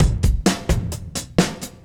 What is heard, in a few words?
Beat Style A 130, breaks; 130bpm